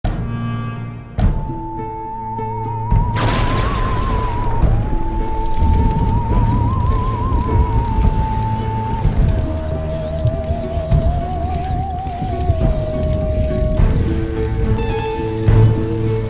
Halloween background music noise and sound effects